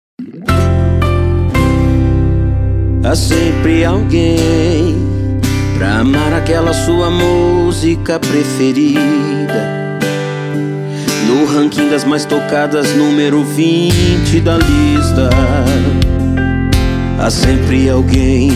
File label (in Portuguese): sertanejo universitario sofrencia